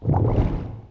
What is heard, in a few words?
EMPTY